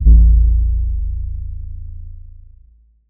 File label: cloud bass